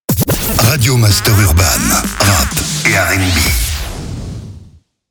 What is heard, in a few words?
JINGLE; RADIO MASTER URBAN; Habillage